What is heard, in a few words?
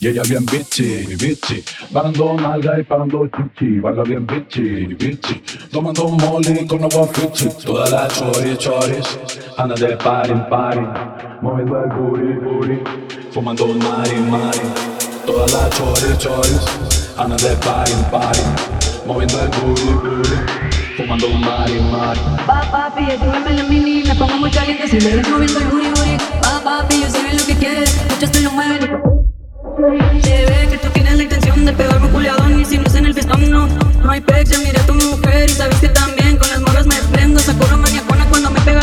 Tech House 126 Bpm